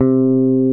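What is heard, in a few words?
C3 FINGER BASS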